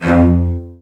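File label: CELLOS G2, Strings; ILIO; Synclavier Strings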